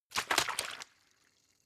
bottle water shake short; berufe

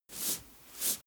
makeup cotton stick brush double; haus-garten; badezimmer

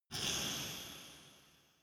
dark resonator hit double soft; maschinen

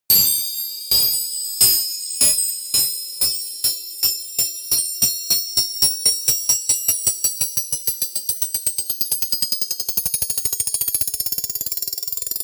metal hits, Risers; whooshes rises

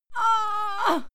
female fight moan pain heavy

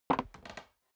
step boots wood creak single right; Footsteps; schritte

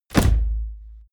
bodypercussion claps

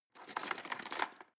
cutlery teaspoon drawer scrape

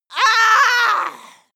female aggressive attack cry, Screams; schreie; menschen

